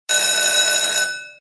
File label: RING LONG; Dazzling Diamonds